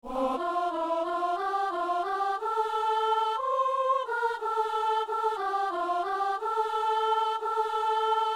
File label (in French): soprano, psaume; Saint-Denis; Psaume 116 (117); Messe du 17 janvier à Saint-Denis